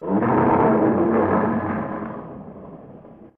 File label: Bagan Roar; Super Godzilla